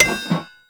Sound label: Street Sign Destroy; Warframe; Ambience; NineteenNinetyNine